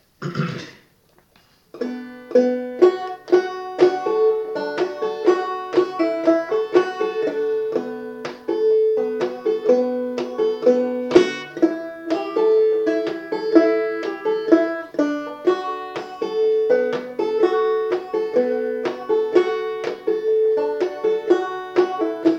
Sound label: wayfaring stranger banjo, Banjo: Open G, Capo 2; Broken / Circle Breakdown band